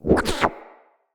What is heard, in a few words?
Mine Osprey Idle; Characters; Corpus